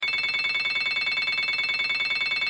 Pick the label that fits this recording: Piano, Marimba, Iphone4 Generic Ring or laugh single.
Iphone4 Generic Ring